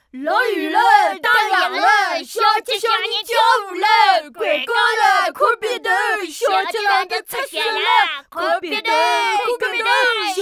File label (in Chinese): 小男孩童谣